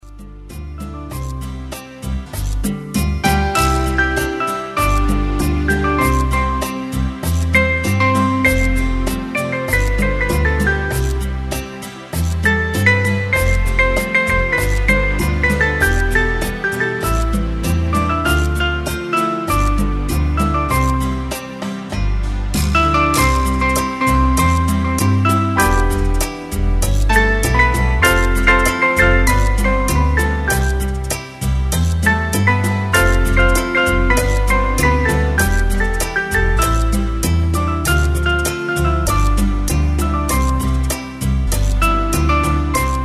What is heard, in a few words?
piano